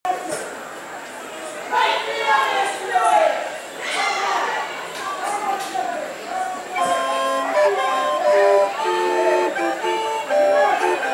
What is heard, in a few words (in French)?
flutes a bec